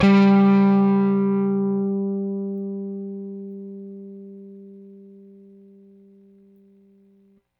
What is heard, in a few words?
Rickenbacker Bass; Airwindows